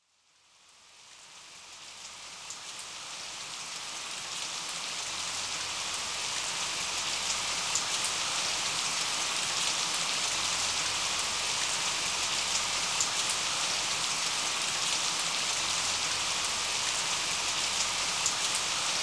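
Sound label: rain nature sounds with music; istselenie dozhdi zvuki prirodi s muzikoi 35 minut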